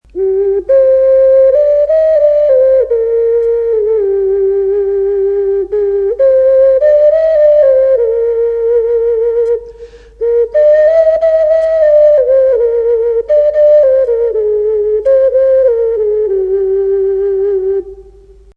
ocarina

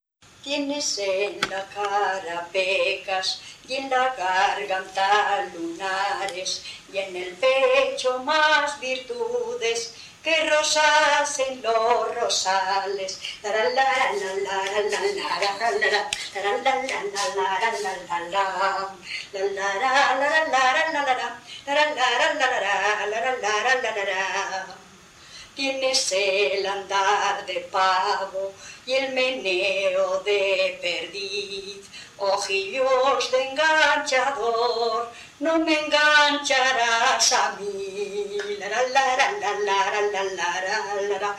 El baile de tres; Cogeces del Monte; Bailes Valladolid

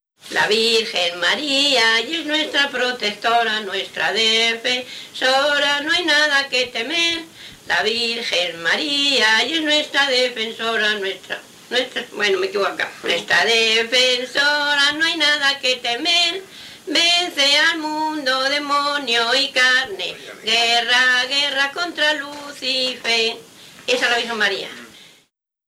Paloteo, Cigales; Paloteo "La Virgen María" de Cigales

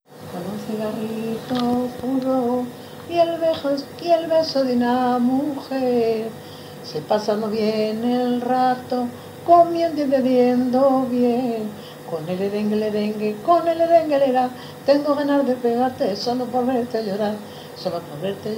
Tonada con el lerengue lerengue

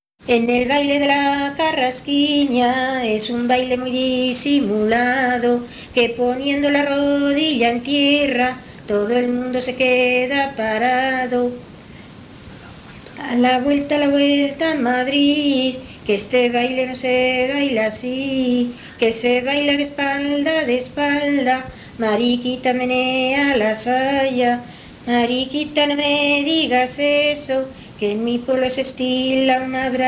El baile de la carrasquiña, Juego de niños; Villabrágima

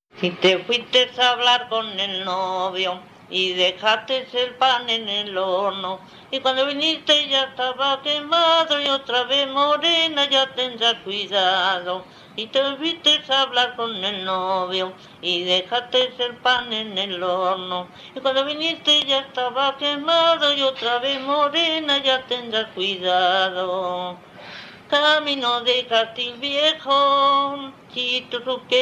Jota, Bailes tradicionales en Valladolid; Villabrágima; Jota "y dejastes el pan en el horno"; Carlos Porro

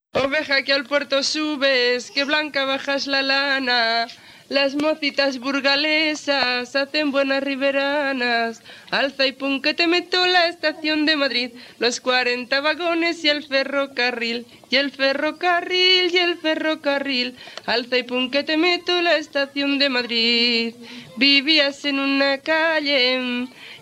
Jota, Villabragima; Jota "Alza y pun que te meto"

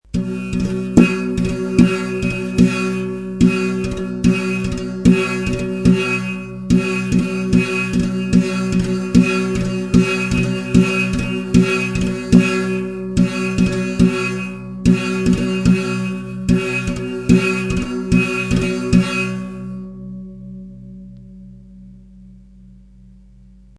salterio

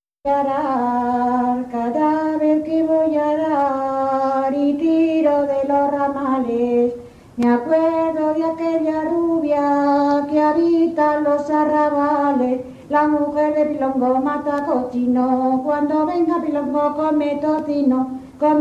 Jotas de Olmedo

